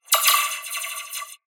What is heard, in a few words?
sci fi weapon blaster accent multiple; soundscrate